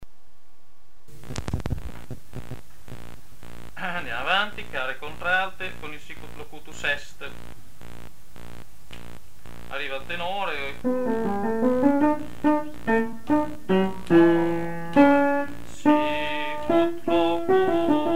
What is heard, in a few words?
Magnificat; Bach